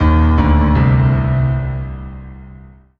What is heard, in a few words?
Solo Piano Negative